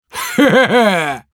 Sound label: laugh short; Demoman; ru